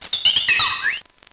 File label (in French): Cri